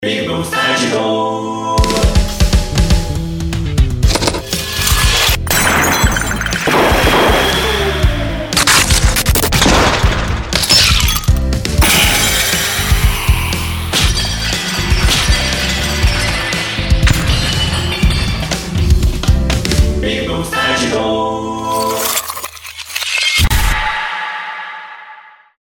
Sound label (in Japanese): 戦闘武器氷; ぴぐみょん効果音素材集; ぴぐみょんスタジオ